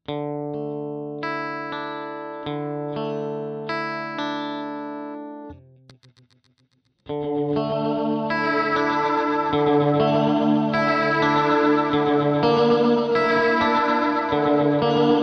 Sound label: LFO; SPACE BENDER x10